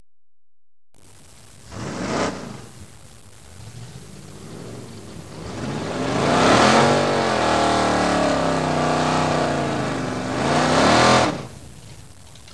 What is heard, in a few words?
AUTO, car sounds